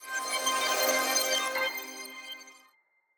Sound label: startup, phonetones; China Telecom